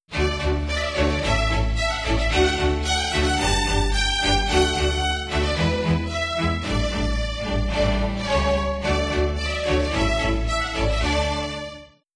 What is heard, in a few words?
Level Complete; Buildalot